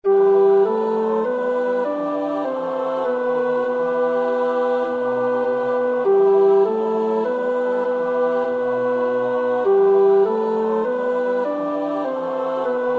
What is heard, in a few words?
tenor